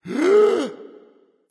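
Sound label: nonverbal breath; smite; voicepack; ullr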